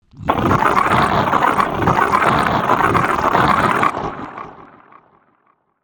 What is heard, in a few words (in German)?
Monster